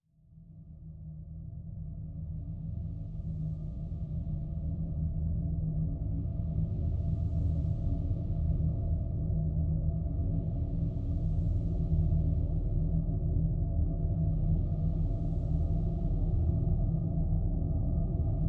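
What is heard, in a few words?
Drn Vertigo Low, drone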